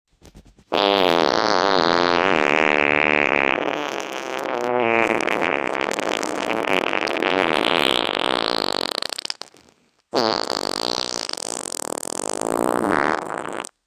disgusting fart sound; toilet

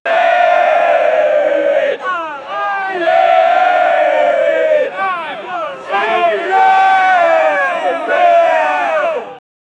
multiple people chanting

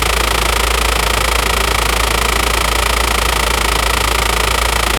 propellor engine, airplanes

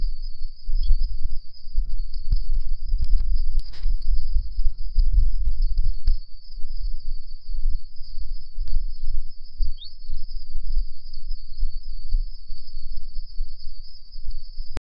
village night; 19th century; lovecraft

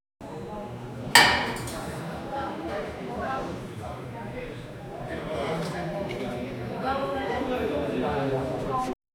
bar sound

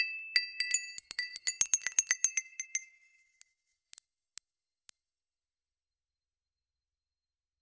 crisp utensil chime, dessert

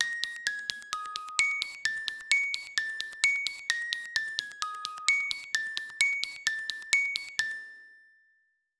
chinese music box 130 bpm

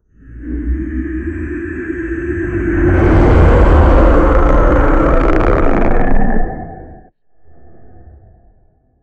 the sound of a monster, emerging

